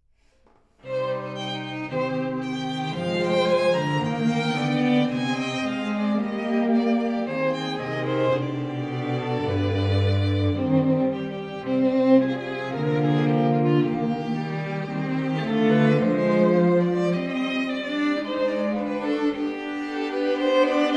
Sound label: string quartet